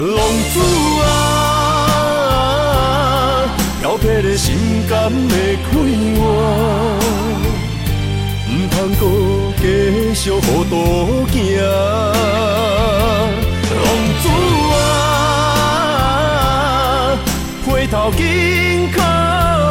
Chinese Song

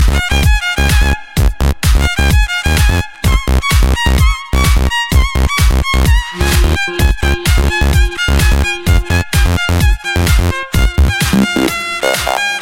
Violin

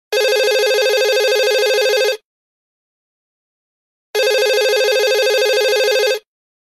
New Phone

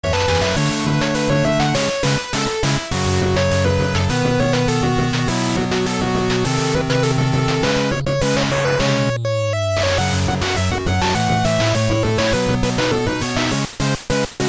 8bit

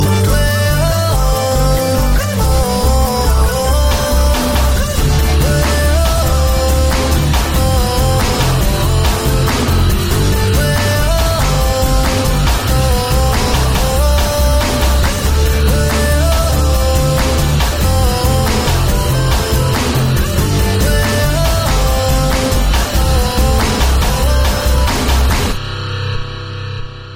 Indian Style; Docomo

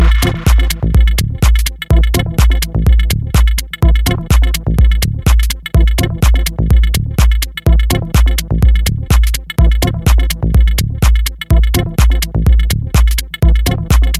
Smooth Electronica

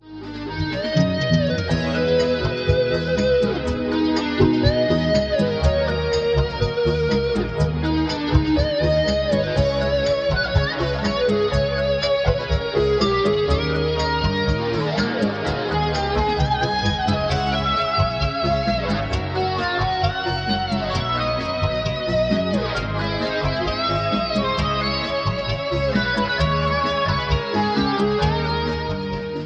Guitar Solo

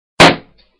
Balloon Pop

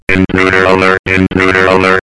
Intruder Alert

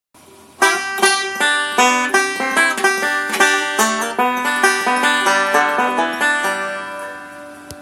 Banjo

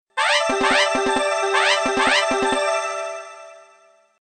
Space Message

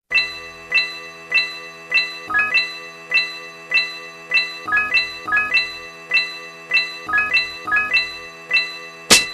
Caution Alert

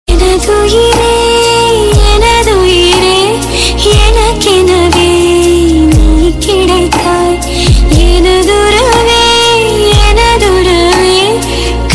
melody; Bheema

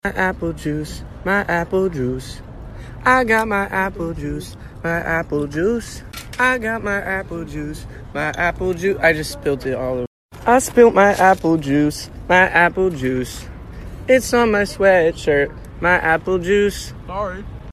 boy singing

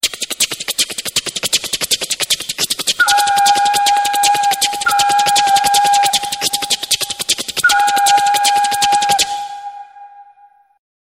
Beatbox Train

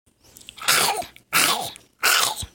rich zombie minecraf

